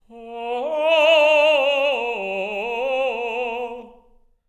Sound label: LYRICAL; Spectrasonics; Symphony of Voices